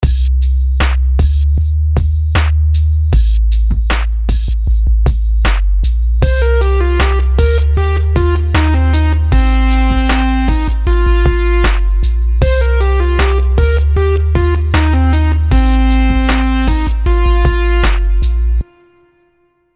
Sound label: melodies simple 16s Cmin; melody